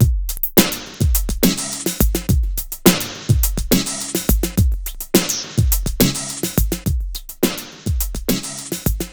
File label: xtra Euro gruv; Sonic Foundry (Sony Creative Software); Ugly Remnants VOL-2